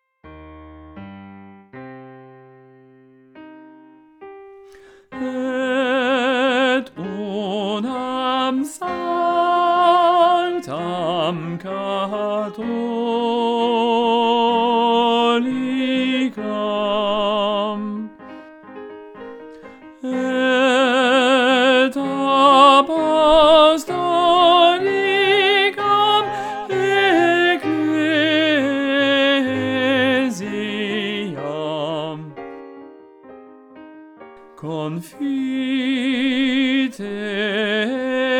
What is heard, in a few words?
tenor Credo Larghetto